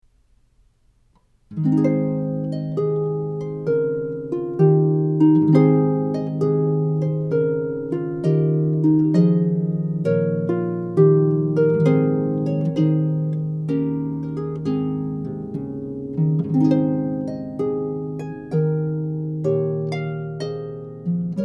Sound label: Air (Water Music); Handel